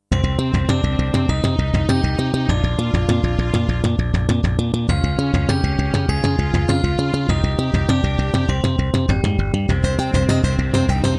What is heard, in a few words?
Pine PT-202 Vivaldi Wavetable DB; Hocus Pocus